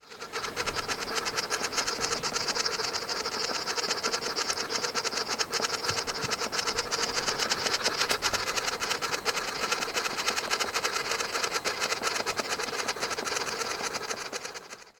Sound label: birds in nest